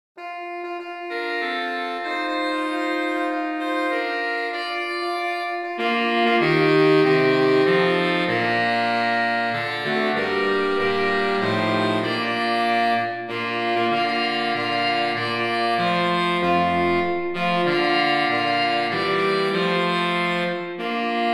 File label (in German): saxophonquintett